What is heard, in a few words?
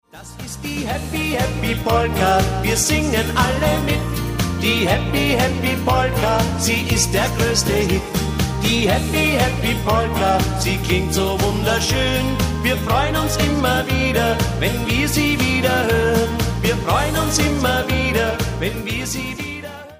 Happy Polka